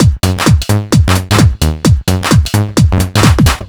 130 bpm full, combi loops, nu dance electro; Zero-G; Soundclash; Chicago Jock

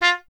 TP F; Miroslav Vitous; Solo Instruments VOL-1

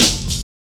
Hard Stepz Cut, Jungle, Drum Loops; 165.5; Zero-G Jungle Warfare 1, 2 & 3; 90 s Sample CDs

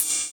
Ride; Crash - Ride; Equipped Music; Loungin House

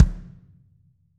AMB KICK; ST AMB KICK

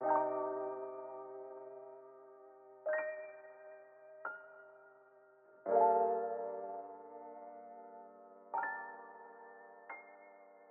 Piano Loop 85BPM Gm; Octane; MINDMINE

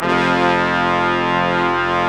BRS QUIN, Quintet; Roland L-CDX-03

